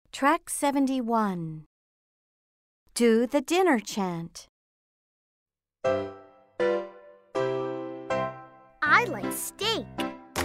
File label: the dinner chant